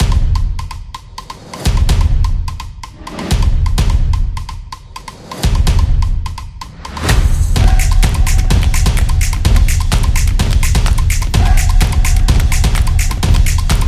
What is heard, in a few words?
Stomp